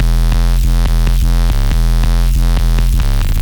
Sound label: Doom bass; fuckery